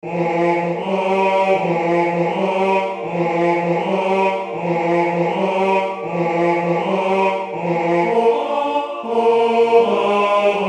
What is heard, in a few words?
tenor; Partons la mer est belle